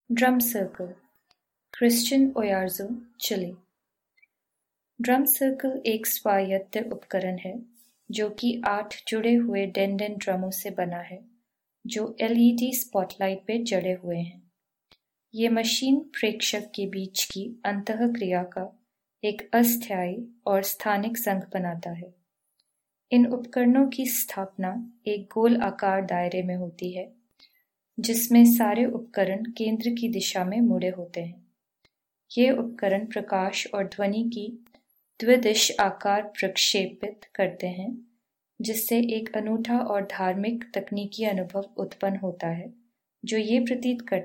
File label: drumCircle; hin